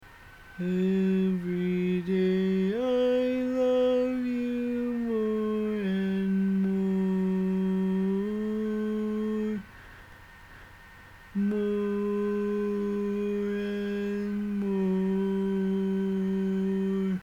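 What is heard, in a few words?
Baritone, barbershop tags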